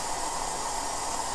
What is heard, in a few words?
gasburner; cstrike